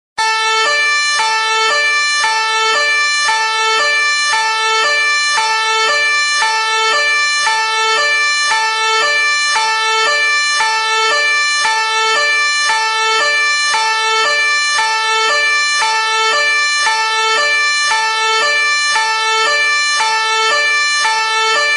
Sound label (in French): sirene de police